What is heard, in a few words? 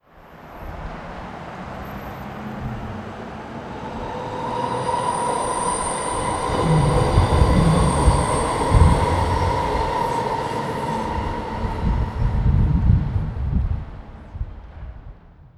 Vancouver Noise mono cardioid